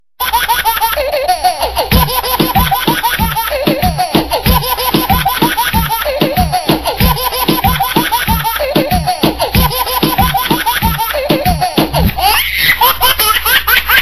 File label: Funny Baby Laugh Ringtone; ringtones